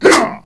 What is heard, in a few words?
Spade Guard Trumpy Atk